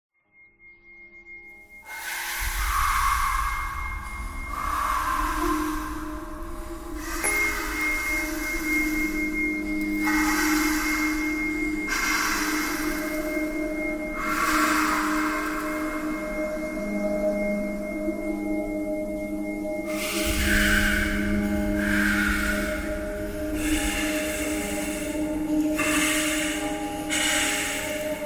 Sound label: breathing thread noise